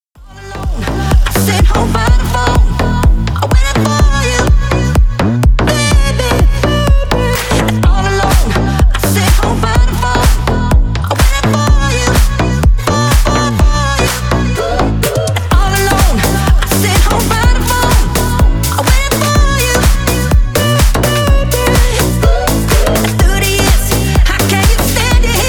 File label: remix